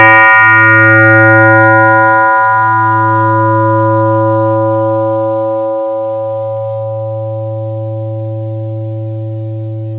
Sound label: bell, fm synth